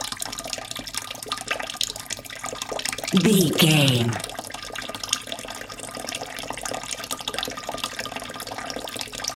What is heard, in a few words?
water dripping running loop